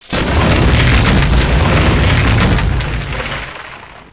Wall Fall

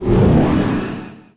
Energy

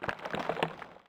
Golem

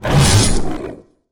newwolf attack

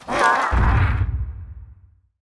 bloodassassin death

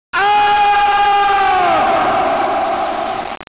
Male Scream

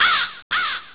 Crow

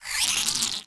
Scorpion Die

